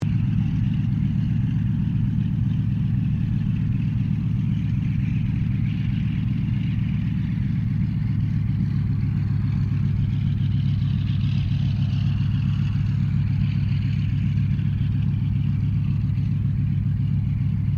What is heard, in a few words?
AIRCRAFT CA-13 BOOMERANG IDLE DISTANT; Airplanes